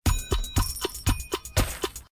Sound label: wait user choice; Ramses 2